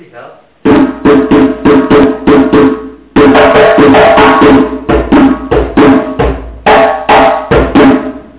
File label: Break Soli Rapide